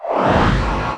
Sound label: HEALTH; Marisa Kirisame